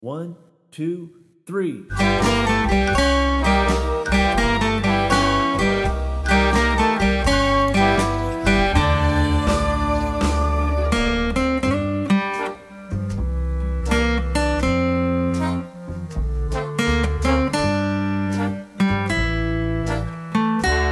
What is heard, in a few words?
Slow Play Along, guitar